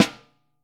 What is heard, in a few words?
Vintage Snare Drum; Q Up Arts; Johnny.C's Vintage Snare Drum